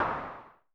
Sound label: SN Filtered, Vermona Mono15 Drums Snare